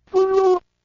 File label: Talk Owl; PC-6001; フクロウとお話; コロニーオデッセイ